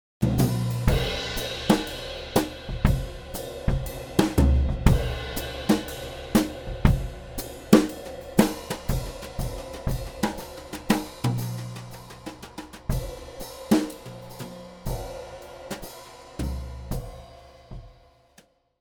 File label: Drums